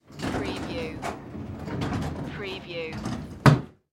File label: Service Elevator Door; sound effects bible